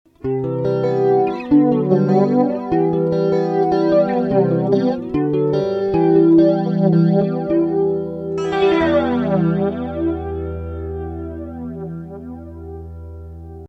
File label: 301 flanger